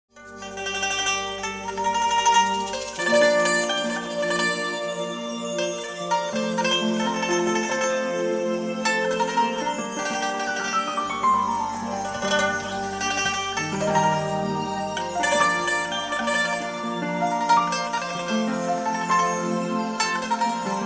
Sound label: Mandolin Playing; Sirasa FM Sarigama Sajjaya